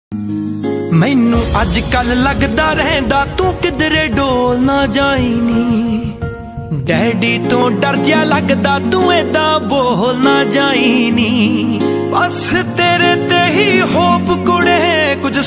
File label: Punjabi Song